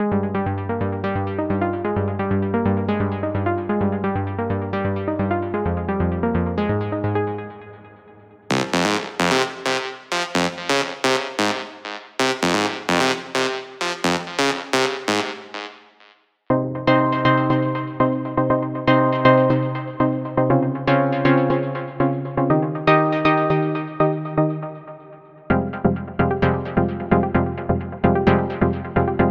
Techno Melodic Techno

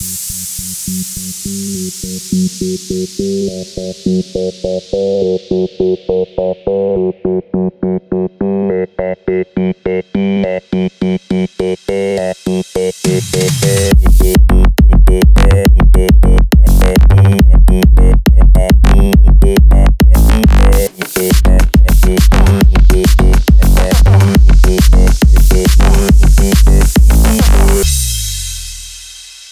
Psytrance; Unison

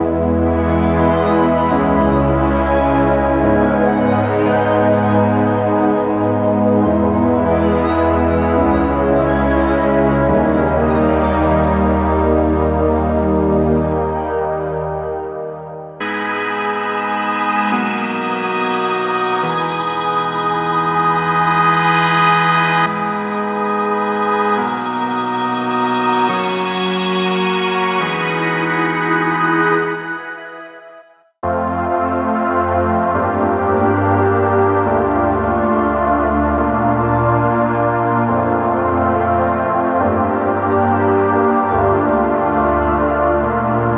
Hardstyle Hardcore

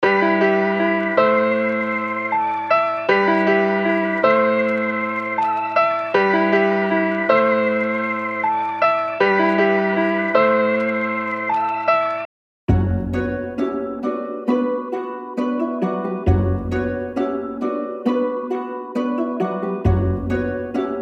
Hip Hop; MIDI Wizard 2.0

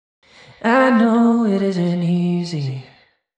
Vocals Warm

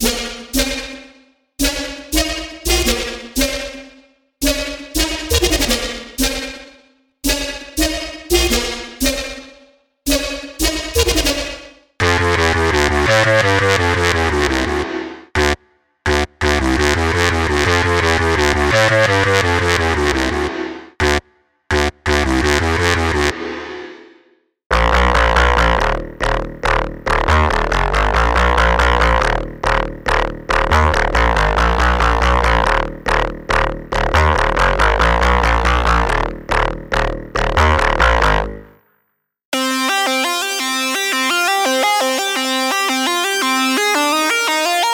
Dubstep DnB

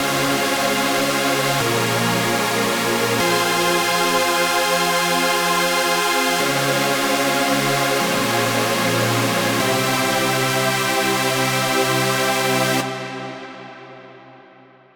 Chords Full Hardstyle Hardcore; midi wizard

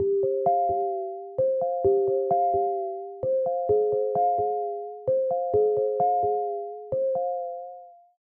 Melody Pluck RB; midi wizard

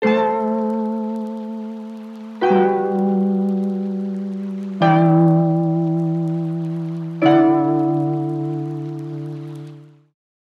Chords Full Reggaeton; midi wizard 2; Unison

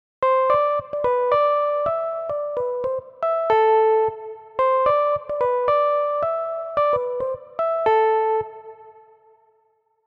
Melody Lead Gospel; midi wizard 2; Unison

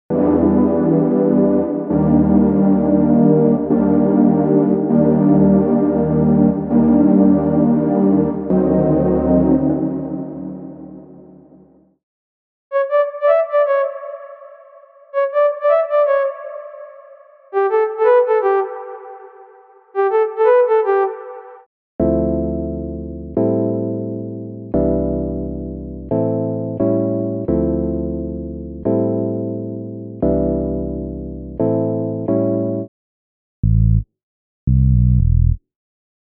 Lo-Fi; unisynth

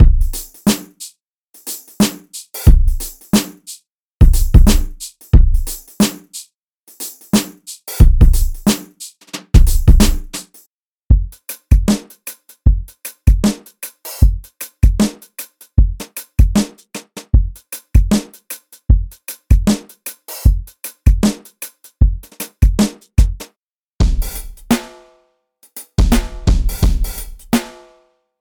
Drum Reggae; Drum Monkey; Unison MIDI Wizard Plug-In; Drum Demo

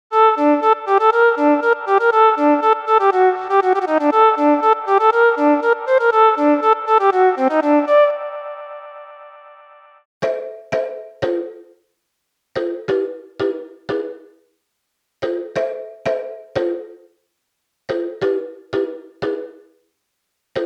Ethnic Full; unisynth

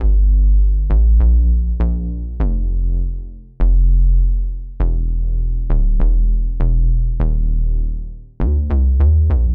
Reggaeton

